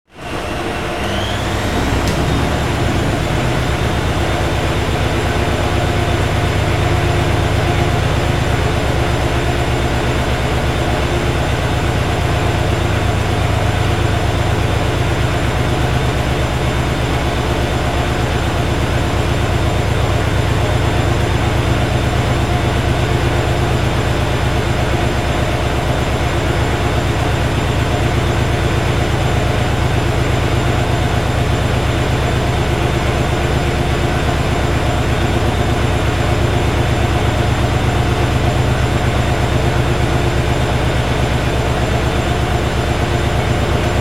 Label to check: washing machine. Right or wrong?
Right